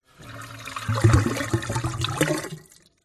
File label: household kitchen sink metal water drain plug hole short gurgle